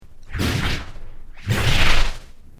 Created Creature Medium Exclamaition, Alien Sound Effects; Created creatures, vocalisations and pretend speech